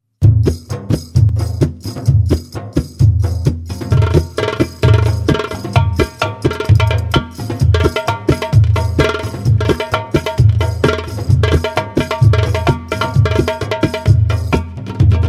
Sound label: Darbouka With Mozmar, oriental belly dance; Oriental Belly Dance Vol. 1(Darbouka & Tabla 2 Vol. 2)